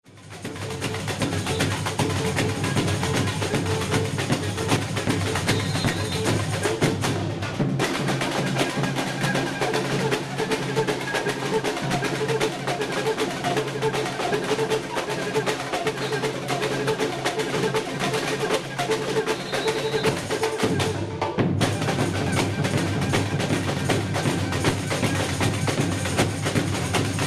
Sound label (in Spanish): bateria